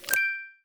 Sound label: user msg, sounds; TeamTalk v5.15 ubuntu22; client sounds Majorly-G